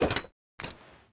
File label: salvaged axe deploy; Rust